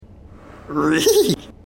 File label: reeheeheehee